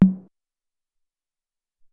Tek Tom, Alesis SR-18

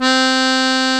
AKORDEON, ORGAN; AKAI S-Series CD-ROM Sound Library VOL-8; SET#1 ORGAN

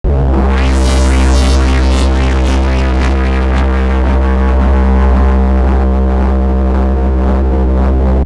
Phaser Note; Drum & Bass; FX; AMG - Prototype Drum & Bass

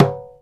DJEMBE, ETHNIC PERC; Instruments & Percussions; InVision Interactive; Lightware VOL-1

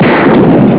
gun; Amiga Soundtracker Sample Packs

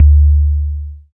BAS FM DX-7

